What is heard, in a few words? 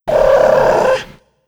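Npc peaky turtle idle; Fly For Insanity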